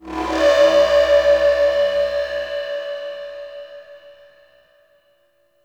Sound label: Energy Texture Large, Corpus Ship ambience; Textures